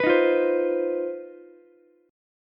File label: C Minor Major 7th Double Flat 5th C down Ukulele; strummed; JGuitar